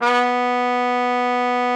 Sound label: TRUMPET B3; Xsample VOL-3